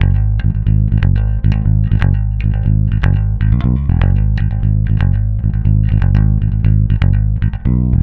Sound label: throb G#, 120, bass; Spectrasonics; Bass Legends